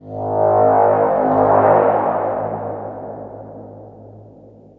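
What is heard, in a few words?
atmosphere; Zero G; Man Machine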